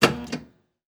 Technology Electronic Pinball Machine Retro Machine Buttons Single